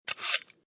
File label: Knife Sharpener Sharpen Kitchen Knife; Scrapes; Sound Effects:Objects; Lynne Music